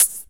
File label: HH, Dance Mix